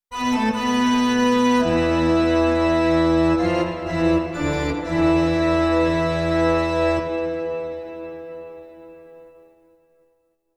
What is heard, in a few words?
KEYBOARD